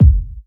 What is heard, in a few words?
dope steel kick drum f sharp key